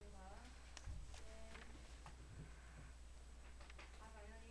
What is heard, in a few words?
horse